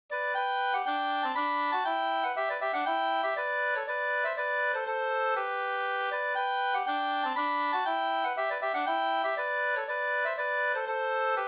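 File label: polska, two-part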